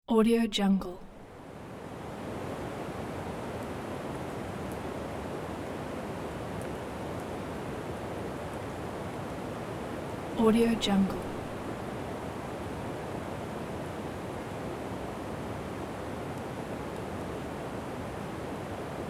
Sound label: sea wind